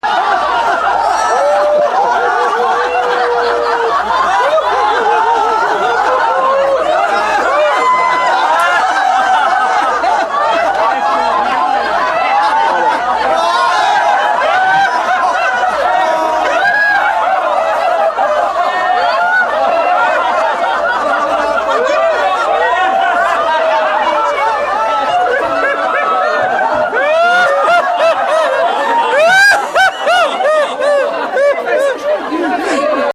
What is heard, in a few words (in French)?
rire